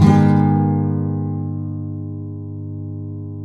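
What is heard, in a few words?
nylon guitar down minor A, flam chords; Spectrasonics; Hans Zimmer Guitars VOL-2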